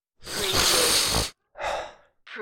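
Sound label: Big Sniff Exhale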